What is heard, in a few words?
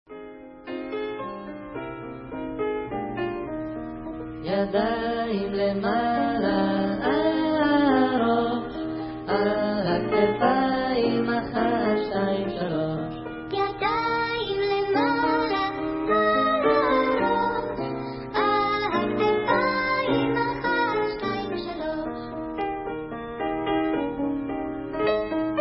Folk Instrumental